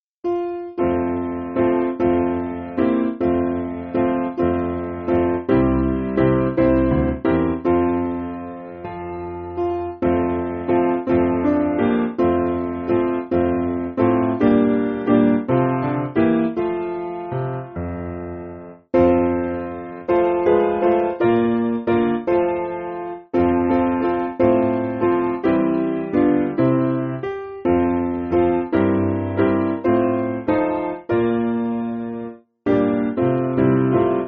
Piano

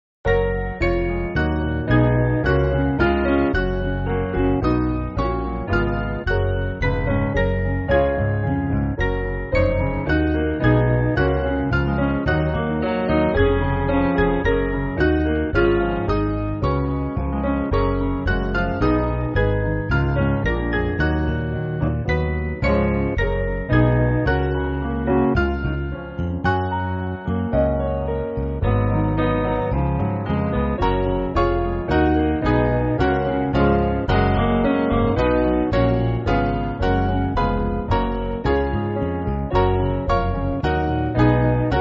Piano, Backing Files for Churches; Mainly Piano (CM)

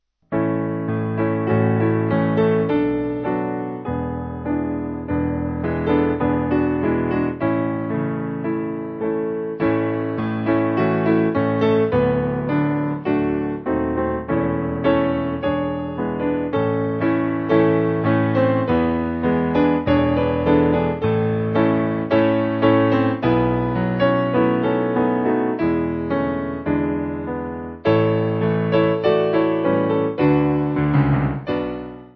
Piano; Tullar

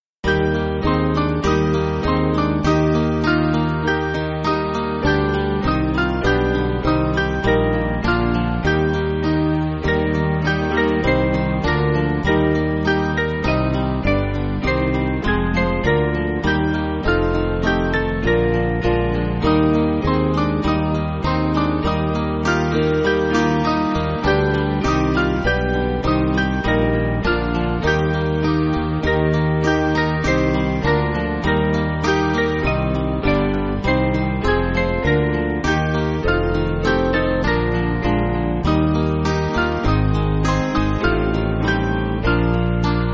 Band; Evensong Is Hushed; Evening Hymn

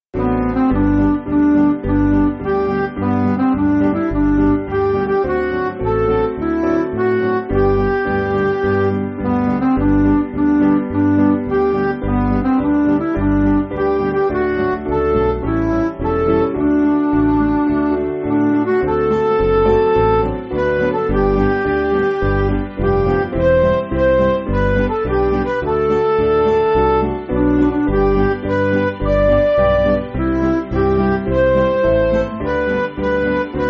Midi

